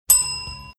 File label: sound of bell